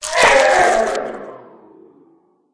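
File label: undead boss death; umertvie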